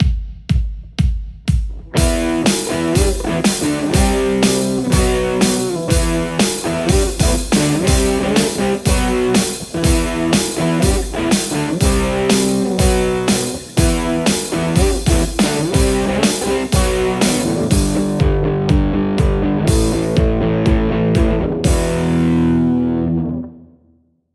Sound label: lespaul; acme tamlahead